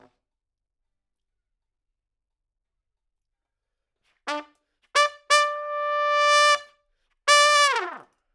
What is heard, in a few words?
Trump